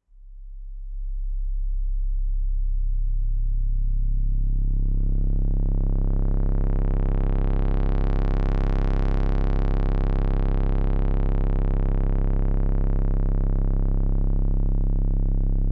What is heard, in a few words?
C Note Drone Sweep Dry; Loki